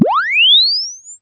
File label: Press Two Sides Chirp; Samsung 4 Recordings